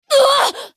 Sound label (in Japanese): ダウン; 迅雷のアドリアナ